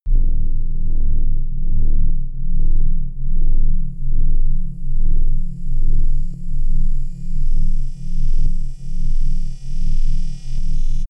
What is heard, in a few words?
drone bass wobble static squeak